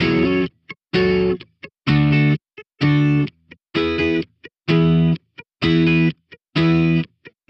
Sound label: Electric Pop Guitar 128BPM F#min; Electric Pop Guitars; Vengeance - Essential Clubsounds Vol.4; Guitarloops